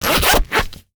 zipper fast

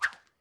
cord whip whoosh; soundscrate

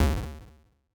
8 bit failed hit

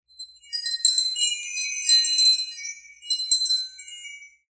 Wind Chimes B

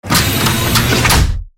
Robotic Movement; SoundsCrate

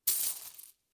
coin hand grab; soundscrate

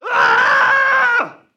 man scream fear; soundscrate

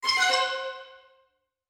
xylo passage

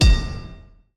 Epic Metal Hit; soundscrate

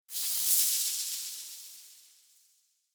Odd Magic Effect; SoundsCrate

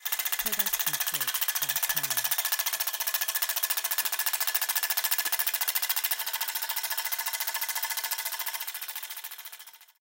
helicopter; soundscrate

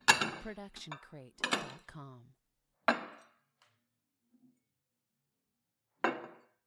stacking dishes; soundscrate

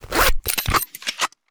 zippers and duffle bag

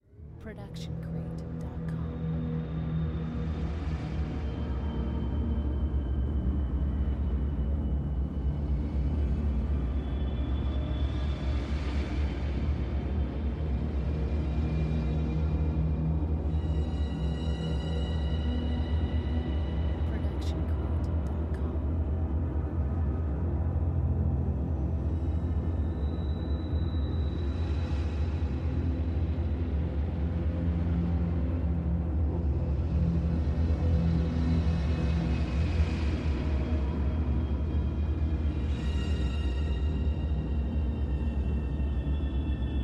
Ambience Descending Rising

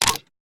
gun check; soundscrate

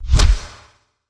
heavy anime hit; soundscrate

